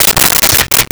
Soda Can Crinkle; Crashes

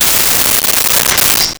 Glass Shatter Light; Impacts; SONY PICTURES SOUND EFFECTS SERIES

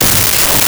skratch

